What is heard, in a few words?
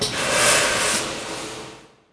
steam blast; Star Wars Obi Wan; XBOX